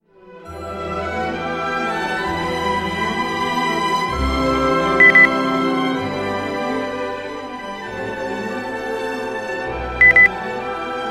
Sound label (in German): klassik